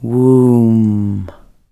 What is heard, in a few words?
Cave